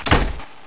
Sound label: door close